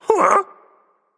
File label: confused, voicepack; smite; jorm